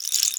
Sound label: RAIN, PERCUSSION; Best Service ProSamples vol.42 - Session Instruments; PS-42 Session Instruments